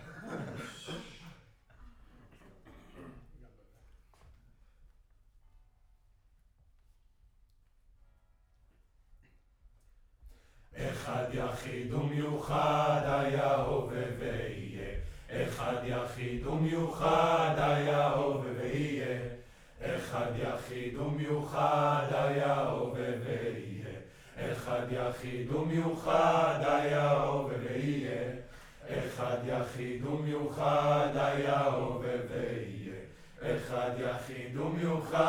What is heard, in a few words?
Choir Far